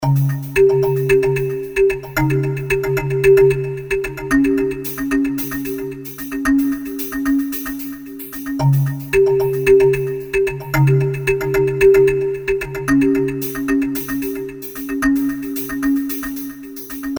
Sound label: Creepy Rhythm